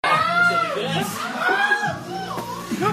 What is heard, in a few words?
ohhhh